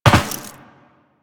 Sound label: Land Metal Perforated, Footsteps; Warframe; Tenno